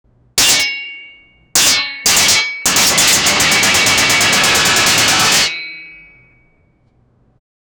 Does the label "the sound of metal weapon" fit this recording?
Yes